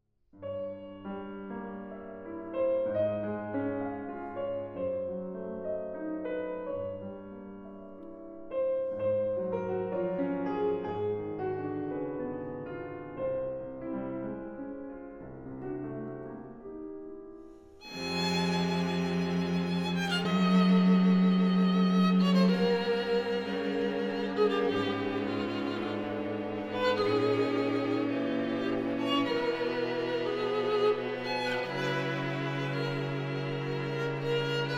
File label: Quintet for Piano and Strings in F minor; Franck